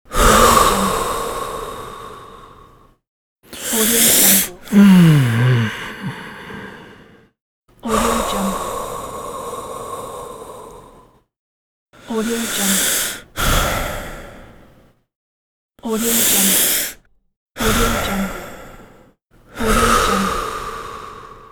male breathing

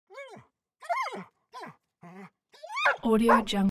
animal dog gsp bark whine; smartsoundfx

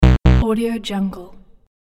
wrong answer buzzer

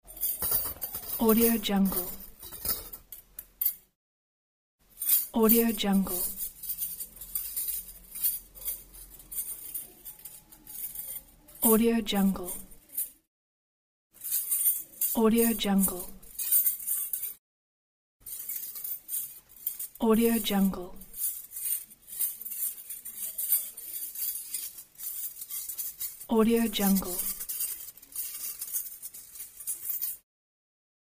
bangles jewelry sounds; billionsounds

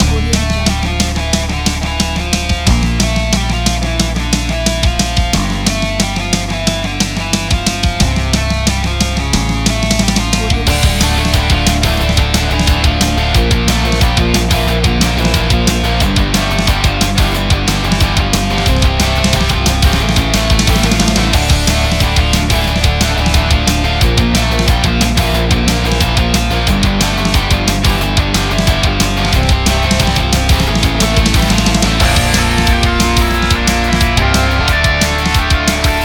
uptempo hard rock

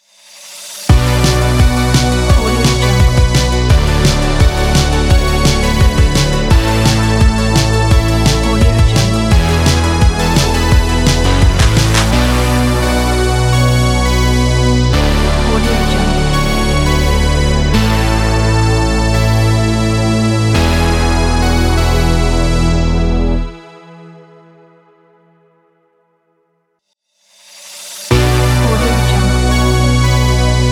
80s retro synthwave logo

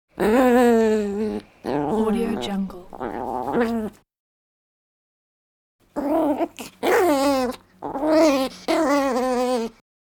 dog creature pomeranian growls vocalizations

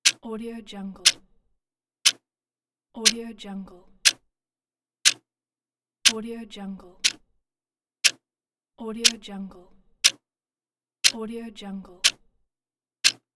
clock ticking

